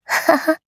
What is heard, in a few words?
laugh, JPN; Eternal Return; Katja